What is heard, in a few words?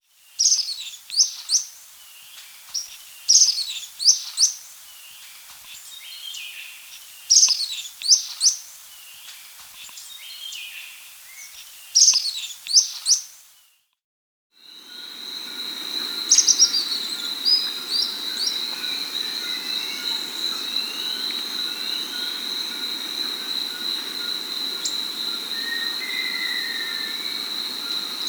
Marble-Faced Bristle-Tyrant (Phylloscartes Ophthalmicus), Andean Birds; S. America